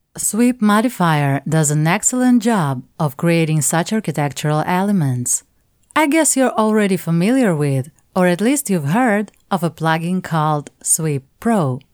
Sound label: sweep modifier